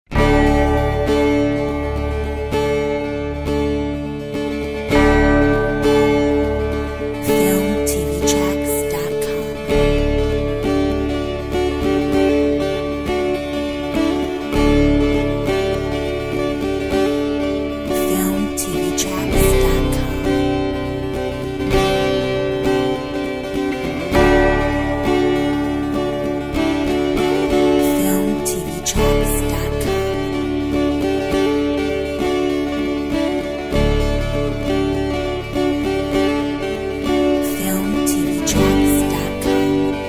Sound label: celtic tune; Filmtv-tracks